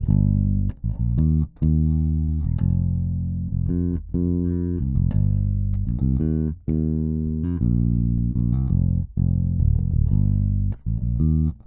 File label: Bass